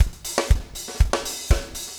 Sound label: 4 on floor 120, loops, 120bpm